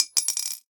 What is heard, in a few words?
Piggy Bank, household rhythm samples Hits